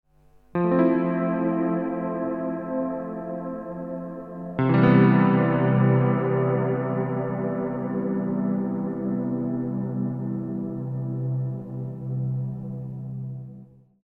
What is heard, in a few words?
CP70 Guitar